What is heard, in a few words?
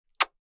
Adult female kiss simple short; Kisses and Kissing Sound Effe